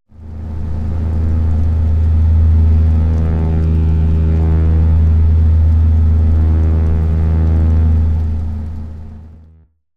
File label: Boiler Humming